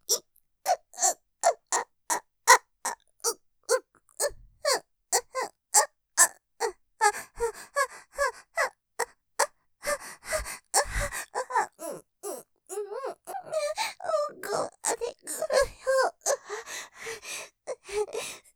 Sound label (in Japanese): 喘ぎ んぁ！！奥まで来るよぉ いきなり激しく動いちゃう, ASMR; ステレオ; ループで楽しむ！シェフの気まぐれ抜き抜きコース